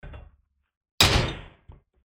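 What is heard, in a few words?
metallic mats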